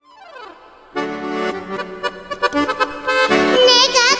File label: korean girl sings